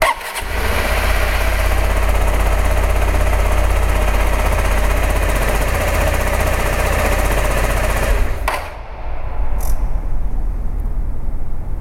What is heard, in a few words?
gear